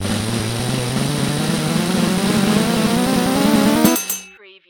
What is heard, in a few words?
Casino Slot Machine Winning Count